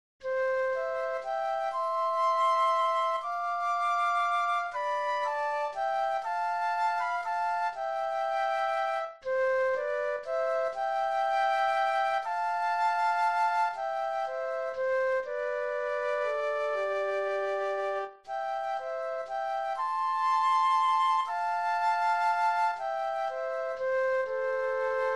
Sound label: Flute Duet